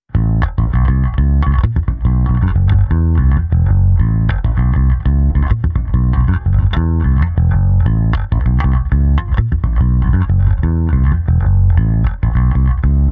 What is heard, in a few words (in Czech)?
Nahrávka se simulací aparátu, kde bylo použito zkreslení a hra slapem; Sadowsky NYC Ultra Vintage J Bass Guitar